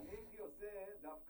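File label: Contrabass DI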